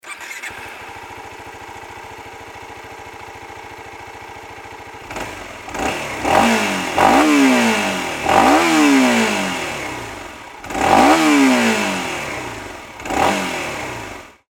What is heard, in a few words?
ninja250sl